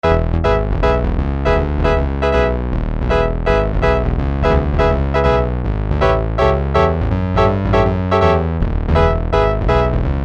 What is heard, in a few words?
Piano II, Roland Juno-106; Factory Preset